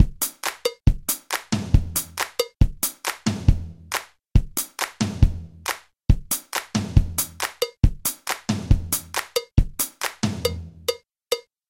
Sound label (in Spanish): Ritmo Hydrogen